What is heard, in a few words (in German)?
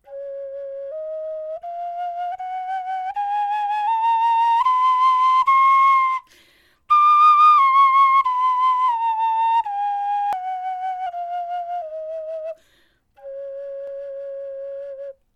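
tonleiter, knochenflöte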